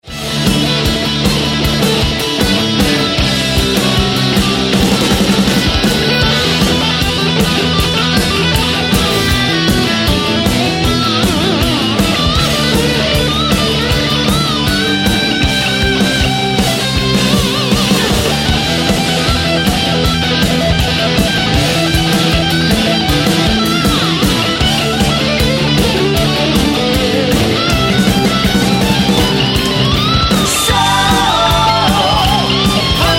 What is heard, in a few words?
solo